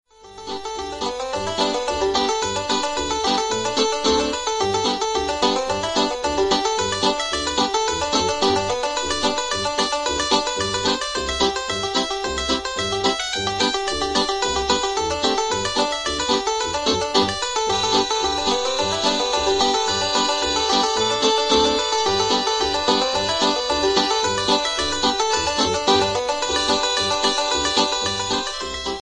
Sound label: funny cartoonic music mobile phone tone